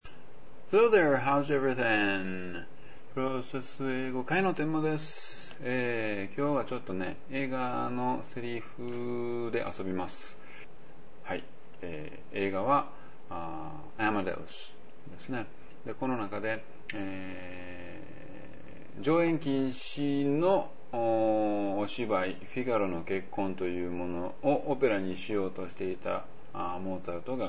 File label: continuous music